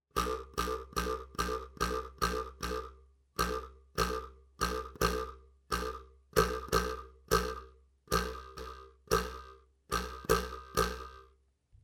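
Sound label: ruler sound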